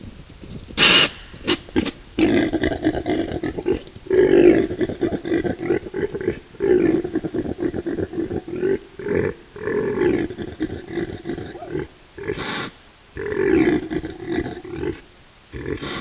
Aepyceros melampus male rut; Impala; Male impala rutting calls

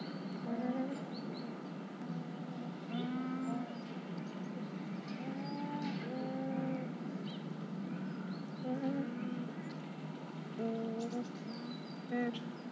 Branta sandvicensis moo call; Hawaiian goose; The very quiet moos, a few birds talk just before the microphone

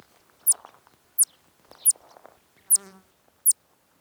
Ochotona dauurica alarm; Daurian pika; Five alarm calls of one adult anima; intercall intervals were reduced

